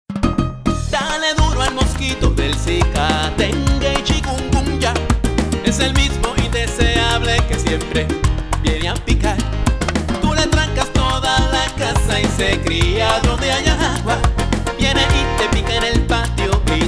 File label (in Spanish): Jingle